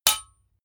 sword metal hit

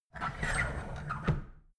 drawer big squeak close regular

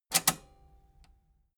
metal button robust spring click enable fast

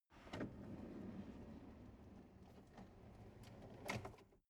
drawer medium open draw long

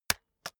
keyboard external space hit slow

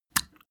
step barefoot wet hard

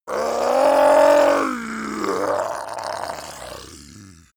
zombie human change

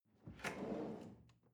drawer large trash draw short

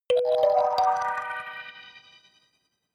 notification kalimba magical triad up minor high

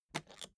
suitcase latch lock spring creak

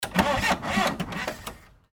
forklift truck

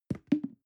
plastic can small fall bounce soft hollow

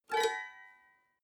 guitar clink stroke up fast

